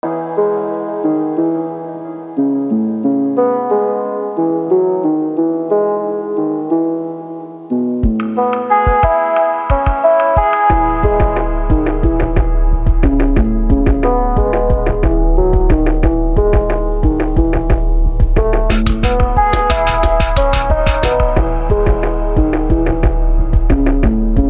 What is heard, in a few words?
lofi